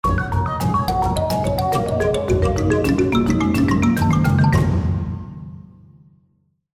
musical spin; Shamans Dream 2; reel

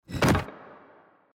reel Spin stone Land; Champions of Valhalla

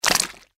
explosion; Fruity Burst 2

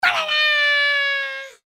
voice laugh; Fruity Burst 2

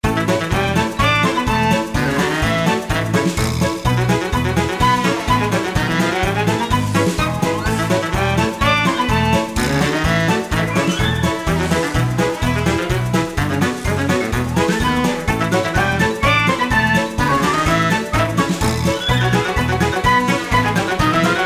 Free Spins Music; Beat the Bobbies 2

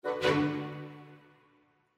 xs win; Champions of Poseidon

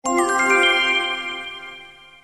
Winning Line; Dynamite Digger Eureka; original dynamite digger audio

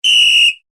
whistle long; Football! LuckyTap; Eyecon

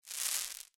claw broken; Fluffy Favourites Megaways

